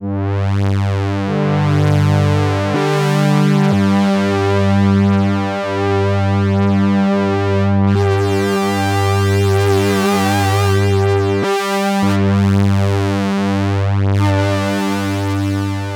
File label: delay vintage saturation